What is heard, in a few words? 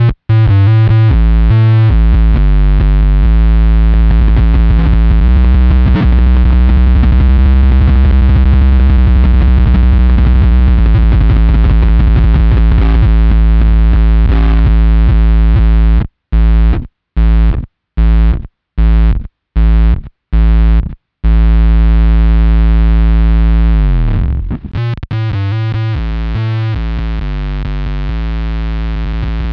boss oc2 normal vs squared, bass; BOSS OC-2 Synth Mod; Clip to compare the before/after into a square fuzz effect; what many people use to morph regular bass into synth